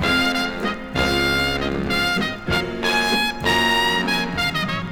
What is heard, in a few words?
horns, phonky loops